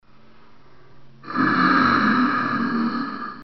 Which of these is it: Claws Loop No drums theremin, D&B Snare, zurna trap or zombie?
zombie